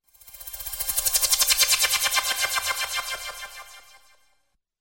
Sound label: smooth signal ringtone